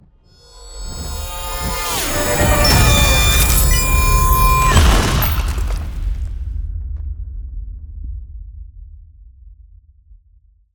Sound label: Ice Spell Explosion Impact Frozen Comet; Ice Explosions & Impacts; Arctic Aura